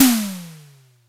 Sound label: TOM, Simmons SD-8 Drums